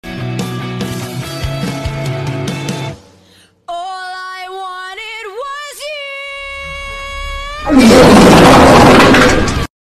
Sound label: all i wanted was you fart; tik tok